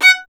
STR VLN, Solo Instruments; Miroslav Vitous

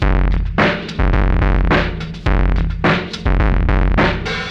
Beat Loops; Zero-G; Monster Beats

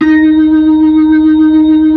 B-3, Blues; Keyboards of The 60's and 70's

